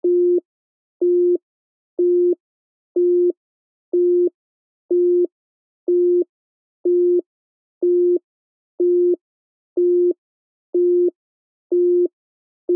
Sci Fi Alarm Beeps Digital Technology Readout Loop; Control Panel